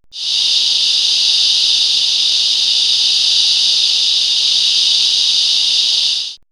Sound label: Nature, Nighttime; X-Static Goldmine